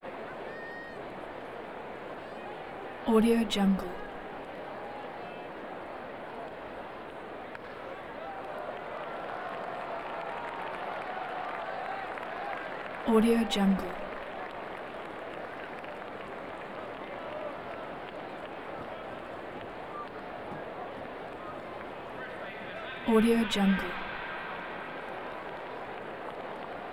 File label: baseball crowd hit and reaction light clapping